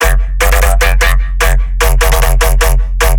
bass loop 150 F; Virtual Riot

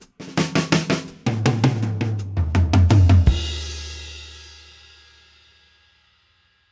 Fills, Monster Beats; Zero-G

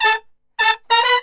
BRASS SALSA; World Grooves; Best Service ProSamples vol.14; AKAI

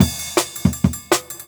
Drum, Jungle Breaks; Original Jungle Breaks

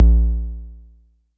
BD er1 decay, DRUMS; Polyend; Legowelt

